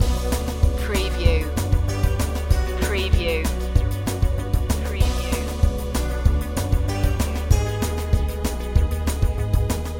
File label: the spooky pumpkin head loop